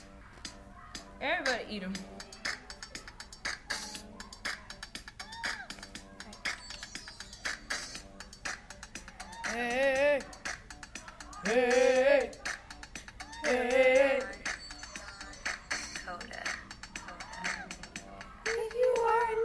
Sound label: commercial jingle